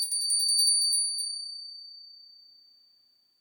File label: Seance Bell Ring; Warframe; Harrow